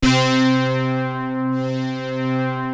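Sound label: MOOG BASS C4; USB Soundscan vol.49; Analog Fantasies; AKAI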